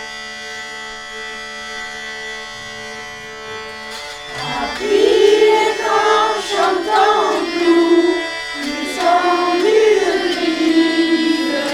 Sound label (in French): chant medieval